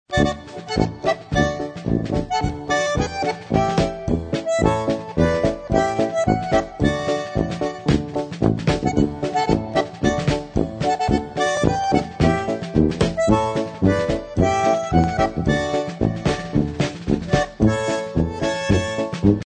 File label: polka